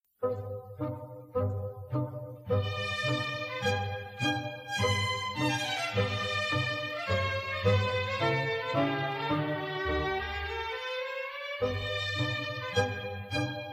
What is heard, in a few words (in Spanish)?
haydn andante sinfonia 101 el reloj